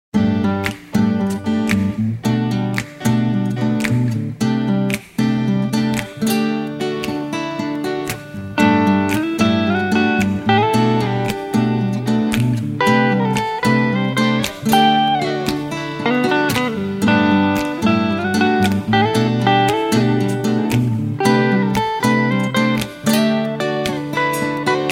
Gitara intro

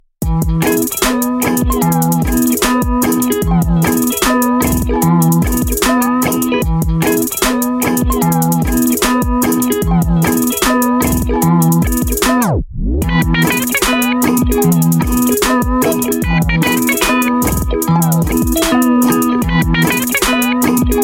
Trap; Spongebob

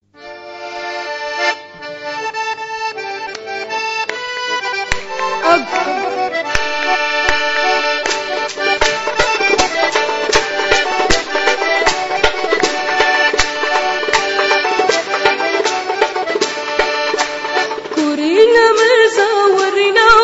Circasian Music

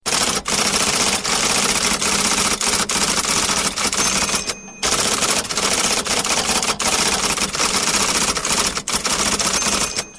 Typing very fast

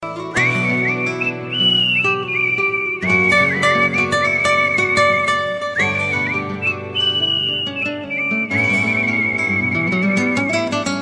Romance Music

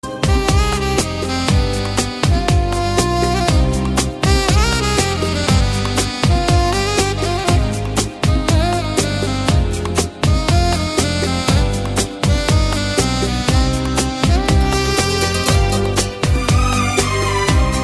Instrumental Classic

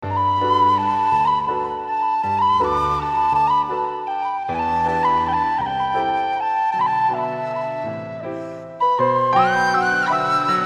Flute

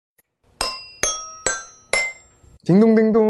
ding

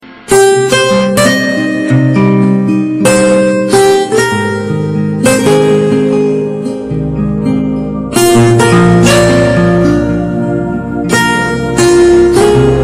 Soft Tone; Asr

